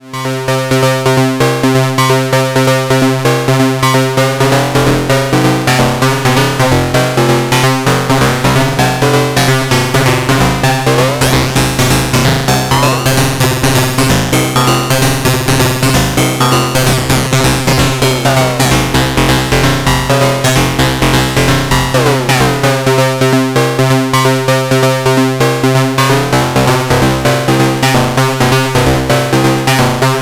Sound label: Trident ModOSC Trac Sync FM moduliert Carrier PW ModOSC2 FM; Rossum Electro-Music; Zing los